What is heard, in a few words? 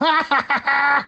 Laugh